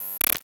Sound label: Menu Access Denied, Computer Sound FX; SoundBits; Computer Sound FX 2.0